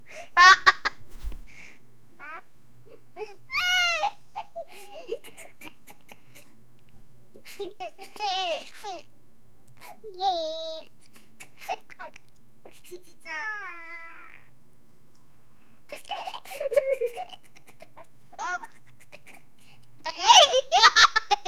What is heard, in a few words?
a 24 year old young